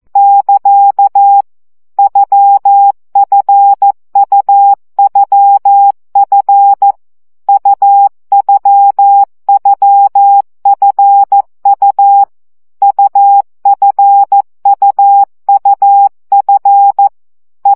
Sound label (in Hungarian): CW gyakorlas u f u2 12 baud